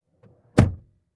Closing Trunk

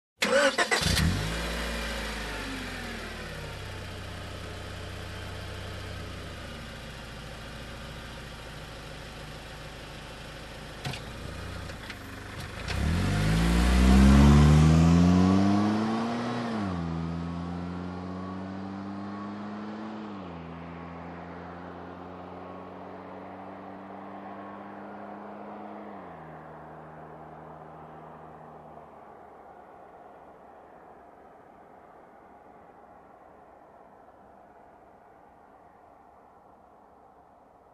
Car engine ignition and drive off

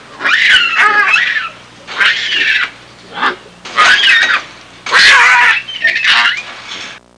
Young chimpanzees screaming

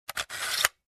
Placing bread on toaster

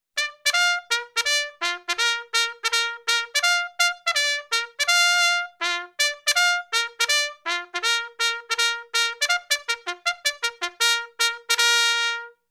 Trumpet Playing Happy Vibe

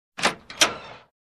Wooden Door close

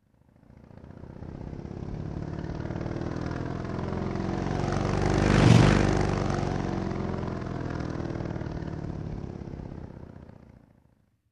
Biker on motorcycle passing by

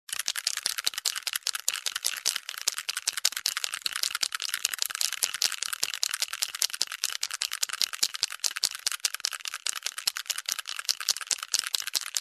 Dice Roll

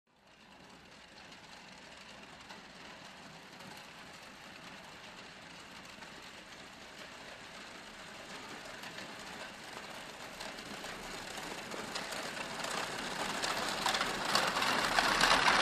Horse Caravan approaching

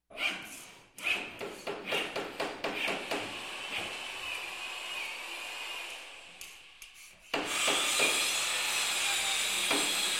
Knocking door in the workshop

